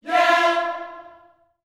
"yeah", gospel vocal, F4; Spectrasonics; Vocal Planet Vol.1 Gospel; akai

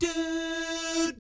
DOO SFZ, Jazz

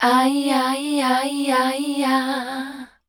MELODIC C, Voice; Best Service; Voice Spectral II

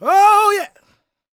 GOSP MALE, Vocal Planet Gospel

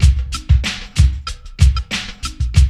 LOOP, DRUM; E-Lab; X Static Goldmine 2

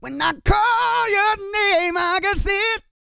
GOSPEL

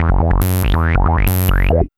B LOOP

